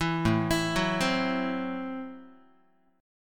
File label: A Minor 6th A Guitar; Am6 arpeggiated; 7,5,7,4,4,x; JGuitar